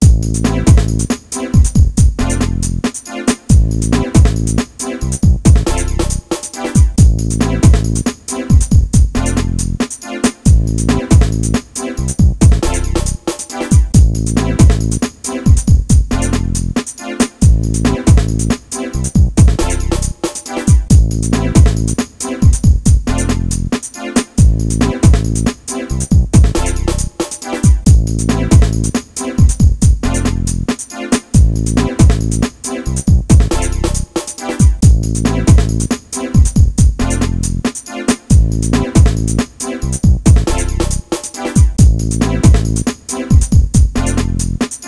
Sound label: Ditty; NBA ShootOut 2002; PS1